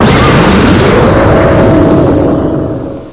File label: bomber